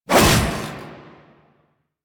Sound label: Whoosh A; Tatsu Prime; Revenant Nikana